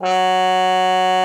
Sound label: cup mute F#3; Xsample VOL-3